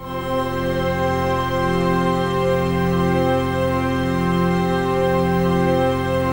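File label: trance pad; Best Service; XX Large - Pads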